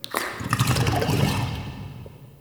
Interior Gurgle Slurps, Infested, Ambience; Misc Indoor

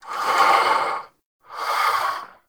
Vocal Breathe; Warframe; Duviri; Dax

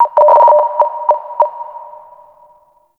Interior Orbital Station Telemetry Mid

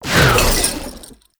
Sickle Heavy Combo; Warframe; Scaldra Butcher Sickle

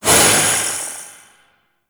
Void Release Short, Weapons; Warframe; Duviri; Incarnon Adapters

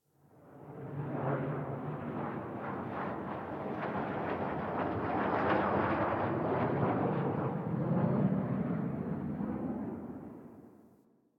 Distant Ambient Fighter Jet; Warframe; Ambience; Nineteen Ninety Nine

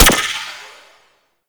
Gorgon Mech, Weapons; Warframe; Void Trader

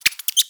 Exterior Forest Critter; Ambience; Duviri

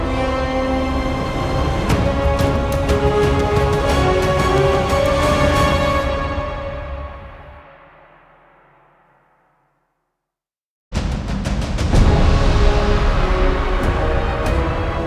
Fire Death Cue; Orowyrm; Warframe; Duviri; Cinematics

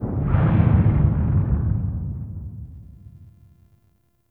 Sentient Vessel Step Delay, Enemies; Warframe; Tau; Movement

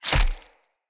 Claw Small Impact Flesh; Weapon Impacts; Enemy; Unarmed

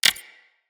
Console Hack Button Press

